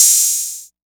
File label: OPEN HAT 808; E-MU Formula 4000 Series Vol. 2